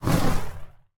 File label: Burning Hands Whoosh; Evolve; Meteor Goliath